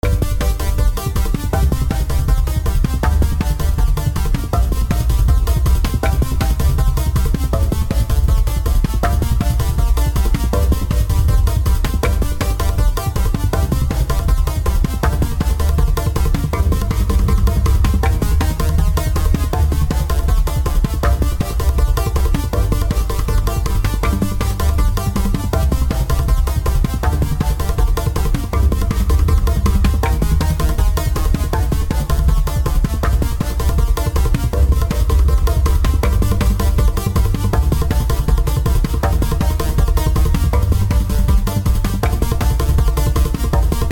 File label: Background Loop